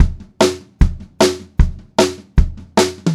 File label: 152 SPEED TOM, Pop-Rock Drumloops; USB Soundscan vol.37; AKAI; 90 s Sample CDs